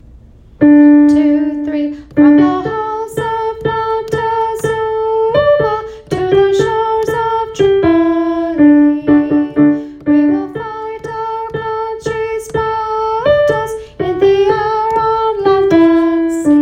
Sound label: Marines alto, military songs